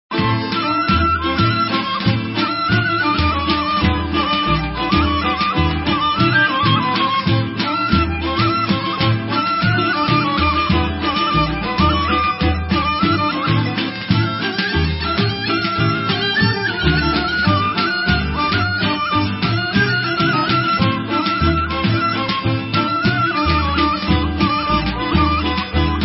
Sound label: Pazardjishka Kopanica Bulgarian